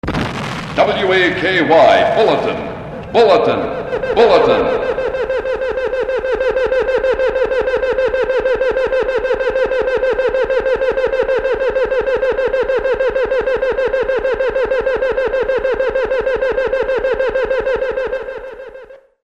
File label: Bulletin Sounder; WAKY